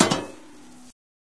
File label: faster metal fall